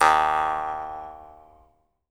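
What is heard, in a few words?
Jaw Harps, Pitched Percussion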